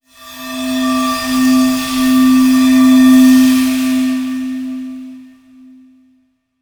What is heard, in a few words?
environment temple singing bowl